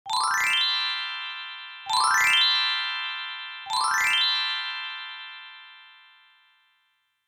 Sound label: Three Magic Sounds